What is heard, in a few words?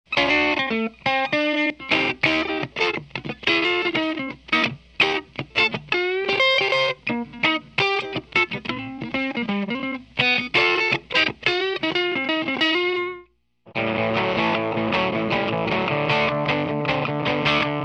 dirty Blackface Deluxe; Model 1A